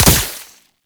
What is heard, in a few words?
Wall Climb Dodge End Point; Warframe; Oraxia